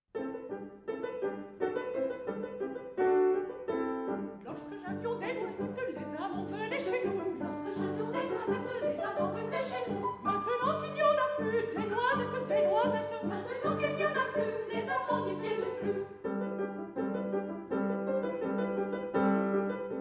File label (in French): choeur de Bourgogne; compli pouilly